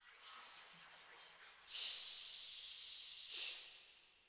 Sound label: choir effects 1, 145BPM